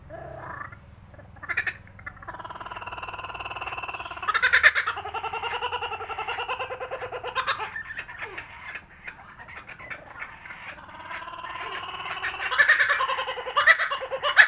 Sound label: Laughing Kookaburra